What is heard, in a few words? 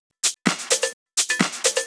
Electro Loop 128 BPM; Vengeance Samples